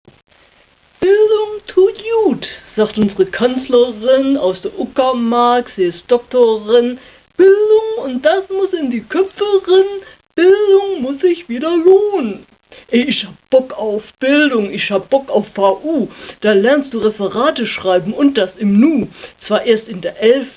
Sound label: Rap